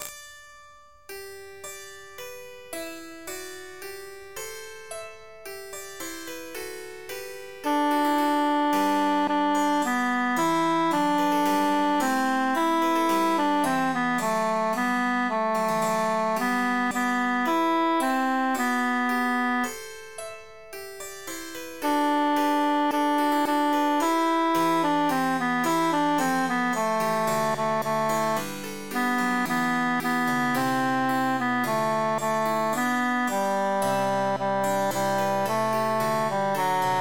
tenor emphasized; jubilate deo

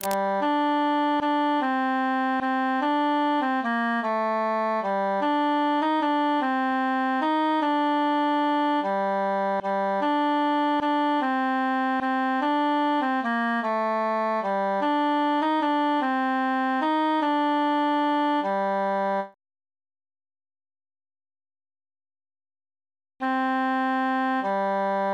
three country dances altus solo

